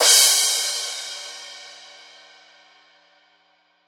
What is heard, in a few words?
Crash; Hats and Cymbals